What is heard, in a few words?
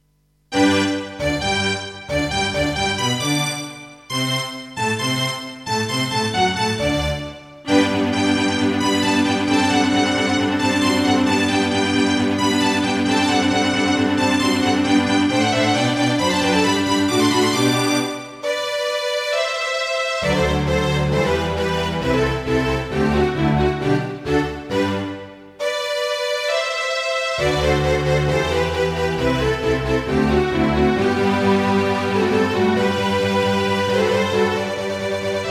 Yamaha DB51XG GS MODE; Mozart - Eine Kleine Nachtmusik partial; classical